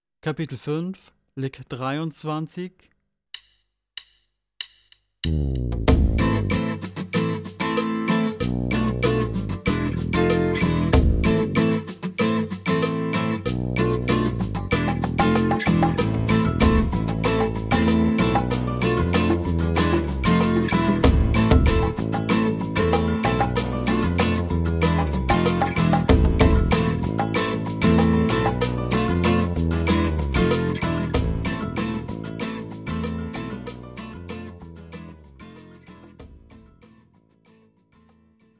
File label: Latin Style Guitar